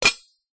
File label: metal pan close down on pan; household; zapsplat